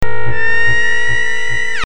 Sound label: vox scream hit